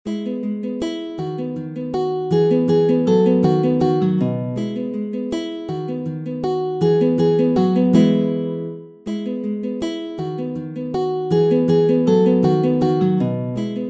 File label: Walze, Classical guitar; Ferdinando Carulli; Op. 241 Méthode pour la guitare